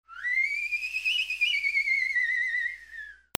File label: WHISTLES